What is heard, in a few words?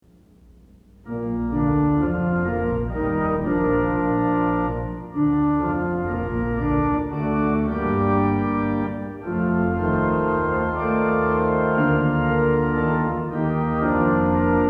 Organ Music for Worship Services; Roll Out, O Song to God; Sixteen Liturgy Hymns